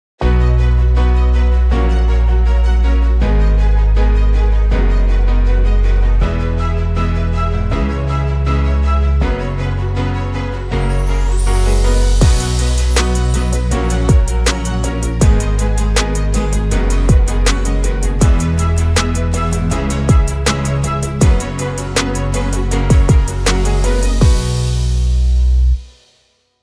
background music for video promo stories positive spring day; inspire